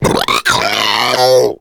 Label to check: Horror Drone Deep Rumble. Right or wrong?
Wrong